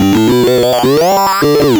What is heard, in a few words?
appraiser sing dragons teeth